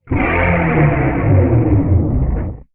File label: guitar